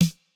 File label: snare; Reference Beat BPM120; j city pop 1980